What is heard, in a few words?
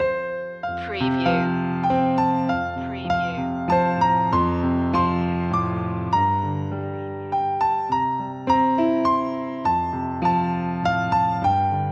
piano loop; away in a manger; media music now